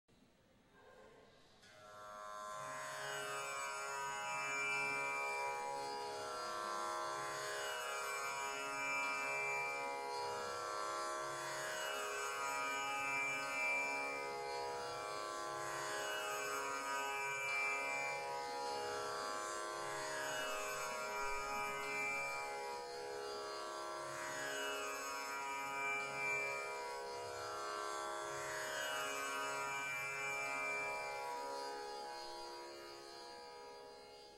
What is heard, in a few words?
Tambura demonstration